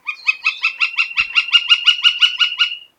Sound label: Gilded Flicker